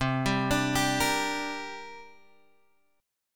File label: C 7th Suspended 2nd, Guitar, Open G